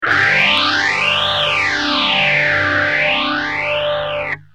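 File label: guitar, phase 100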